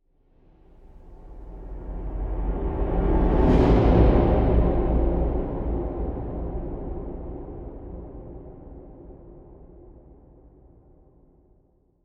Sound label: Low Swoosh